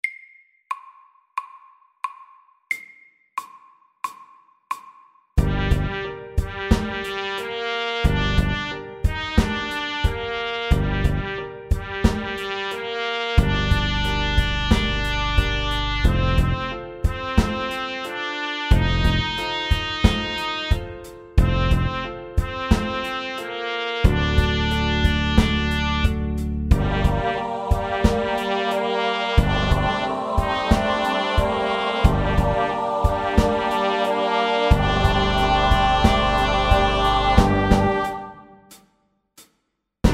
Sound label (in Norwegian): Minor rap sakte